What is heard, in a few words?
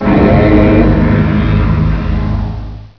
chant player join; dm clocktower